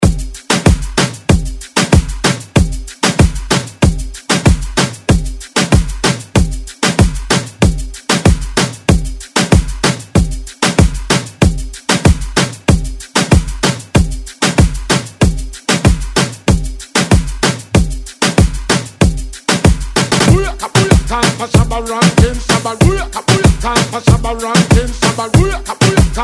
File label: Moombah Redrum